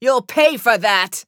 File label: Taking Damage; Paladins; Imani